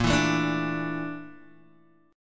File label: Bb Major 7th Flat 5th, Guitar, Open G